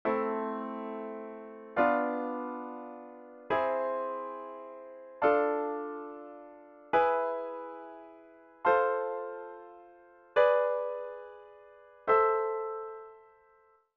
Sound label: Minor Chord Progressions Harmonic Minor Scale Chords